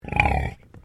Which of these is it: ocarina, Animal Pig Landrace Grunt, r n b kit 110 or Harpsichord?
Animal Pig Landrace Grunt